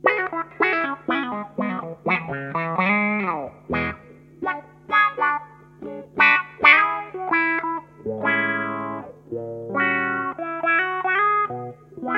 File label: strato talla resonant